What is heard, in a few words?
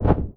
hit